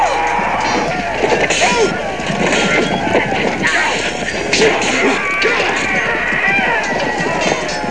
battle

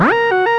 siren red alert; The Official Guide to Babylon 5

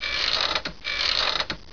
Lamp; BigMax nº 1

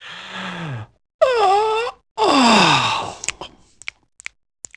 yawn

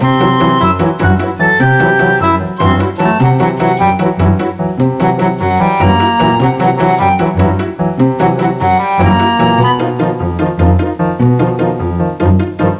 theme

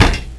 Bottom Out; Dirt Bike 2.1

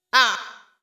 VOICE GRL HOUSE 125 A, House Voice

